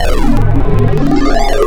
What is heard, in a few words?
Hyper, Wavetables